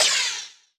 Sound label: jump; Airboarder